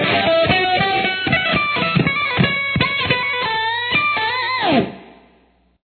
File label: solo